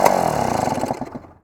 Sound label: stop, vehicles; peel